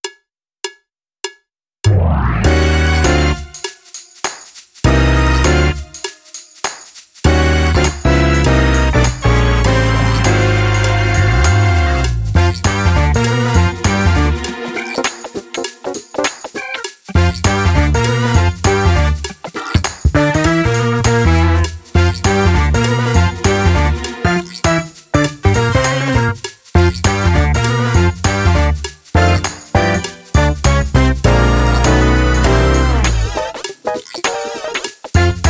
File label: Funk drumless